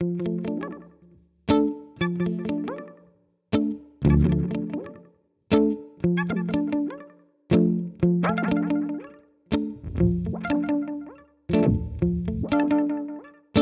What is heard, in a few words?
Thermae Modulation Step; Chase Bliss Audio